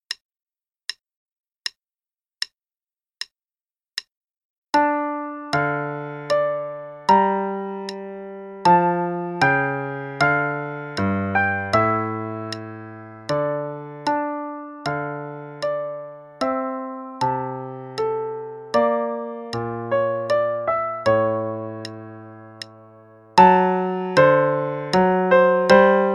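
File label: Play-along, Sarabande, quarter note=78; Corelli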